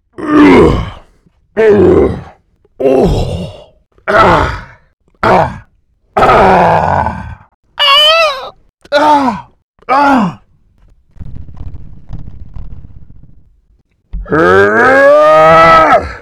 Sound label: Fighting Sounds and Sound Effects Deep Male